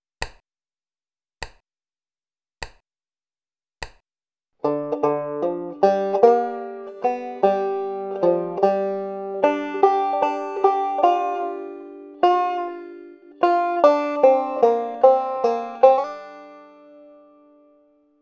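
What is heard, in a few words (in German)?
lick mit blue notes, banjo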